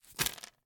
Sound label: Grit, course, hardsurface, throw, scatter; Eiravaein Sound